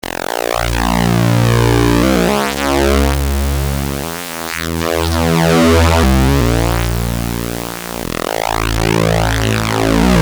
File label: pulse